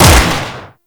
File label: Grineer Lever Action Rifle Shot; Weapons